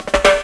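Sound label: snare roll, Ragga & Dub Reggae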